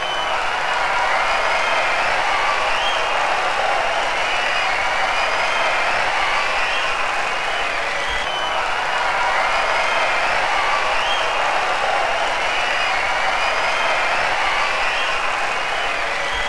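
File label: crowd long; world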